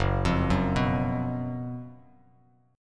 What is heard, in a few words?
Gb Major 7th Suspended 4th Gb Bass Standard; GbM7sus4; arpeggiated; 2,8,4,4; JGuitar